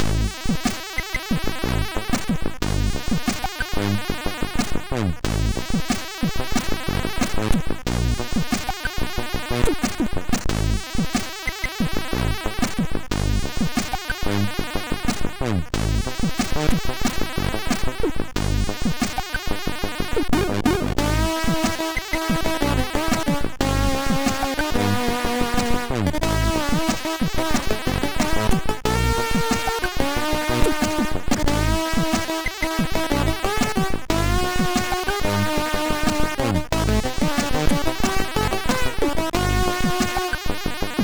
Pod Jams, sid MOS6581R2

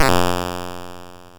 sid MOS6581R4, GAMES; Chinese Juggler

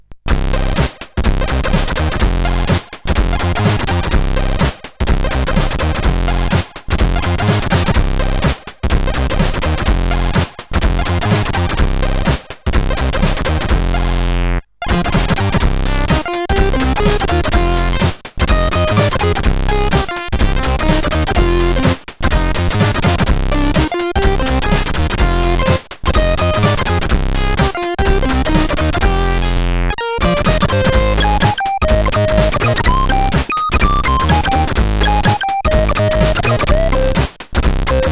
sid, CSG8580R5; Sevenfunk

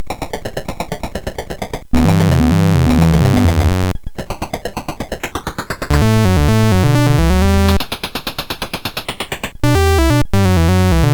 Boogie, CSG8580R5; hvsc

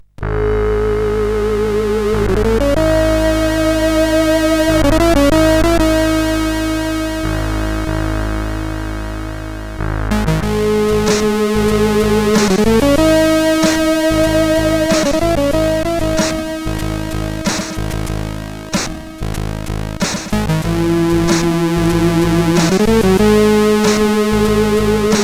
sid CSG8580; Dragon Remake

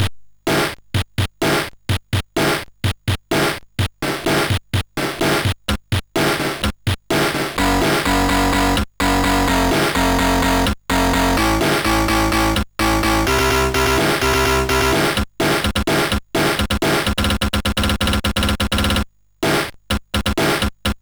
Sample Muzax, MOS6581R3

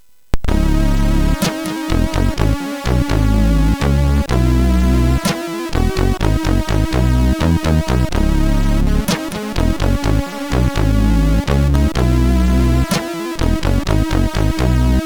Game Music, MOS6581R4

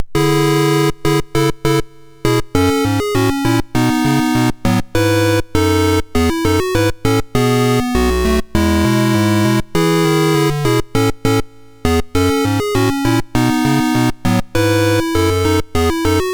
sid MOS6581R2; Treasure Island

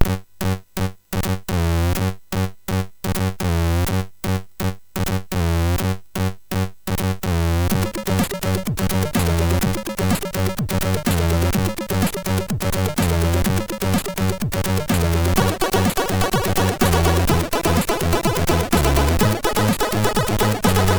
Hum sid MOS6581R3

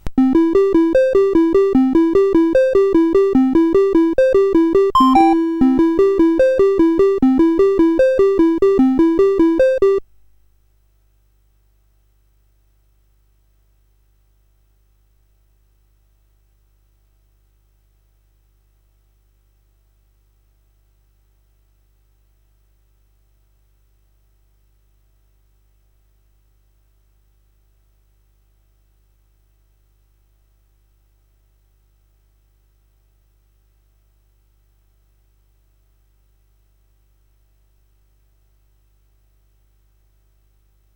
sid CSG8580R5

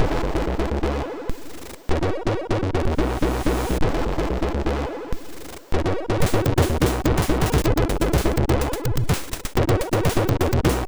Funky sid MOS6581; hvsc

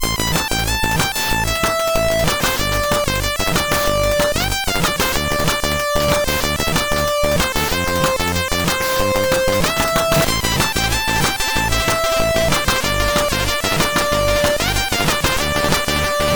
Fast Melody, sid CSG8580R5

